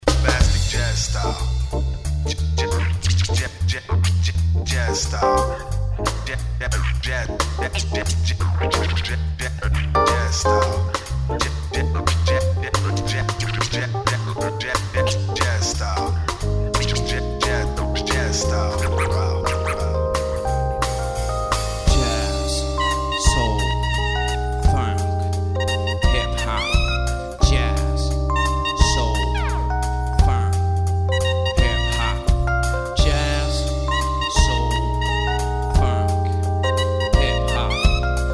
Bombastic Jazz Style live, Acoustic Hip Hop Quintet; france